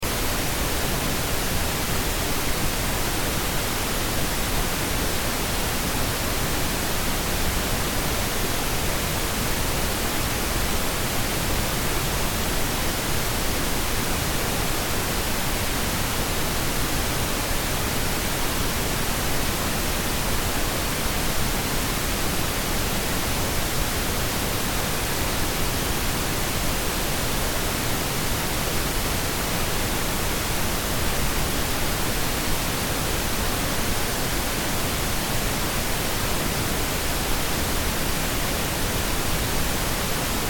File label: pink noise